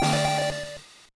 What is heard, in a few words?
Evolution Jingle